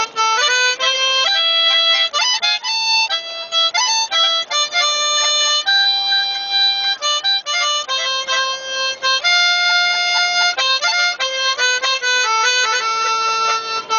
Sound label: Harmonica